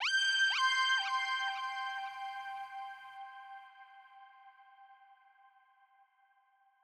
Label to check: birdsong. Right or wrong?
Wrong